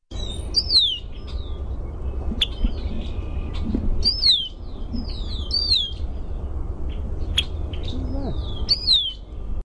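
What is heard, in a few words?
Red-winged Blackbird cheer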